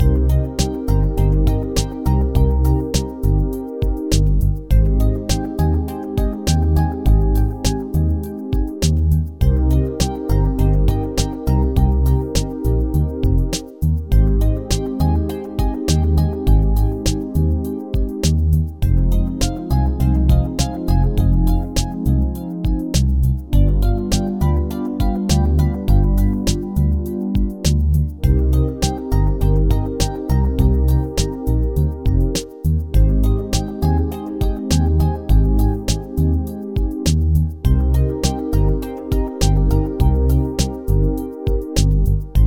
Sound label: Mellow Puzzler, puzzle music